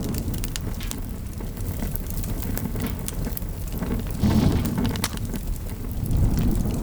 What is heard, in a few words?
Car Fire Loop; Ambience; Props; Warframe; Nineteen Ninety Nine